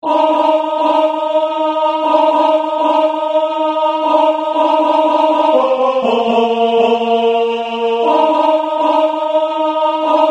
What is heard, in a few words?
free at last alto